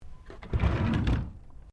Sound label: Drawer Laminate Medium Empty Quiet Open; Various domestic drawers; Household